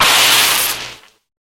sword ignition

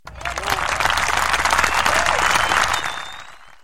clap

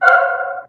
angel gasp

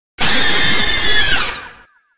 jumpscare; the puppet

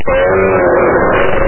null npc hit

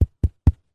mic taps

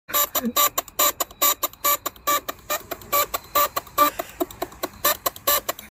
faucet

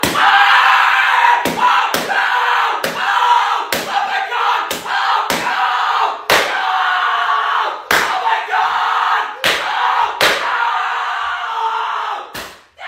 massive whooping

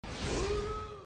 oraaa sound effect

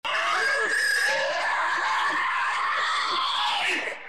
scream

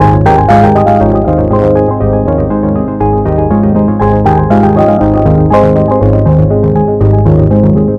chill scratch

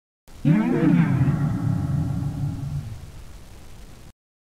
hmmmmm; dr reflex

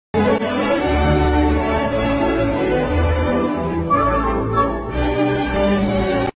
waltz

